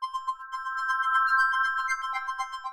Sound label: end Game